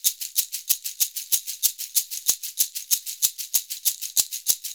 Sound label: SHK, Shaker; Univers Sons; Basicussions